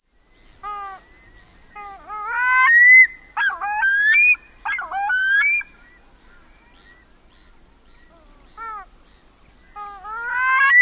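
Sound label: Pacific Loon, yodels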